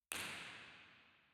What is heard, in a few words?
Auditorium, Theatres; Fila27